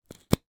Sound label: office glue stick lid remove; sound effects one